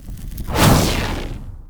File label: Warcry Cast; Valkyr Rework; Warframe; Berserker